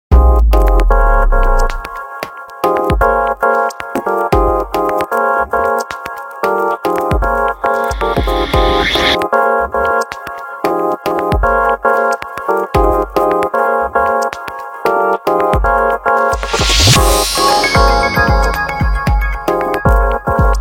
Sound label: Samsung ringtone; Berlin IFA 2015